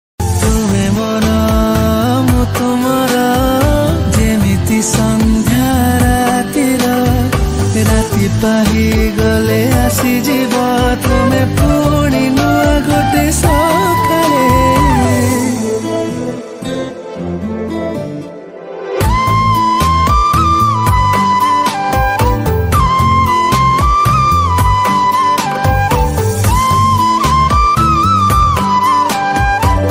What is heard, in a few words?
odia bhajan; jagannath